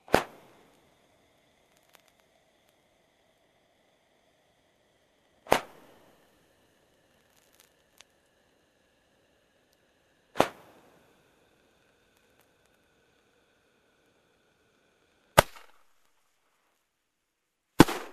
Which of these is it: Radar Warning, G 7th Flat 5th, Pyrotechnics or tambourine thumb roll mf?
Pyrotechnics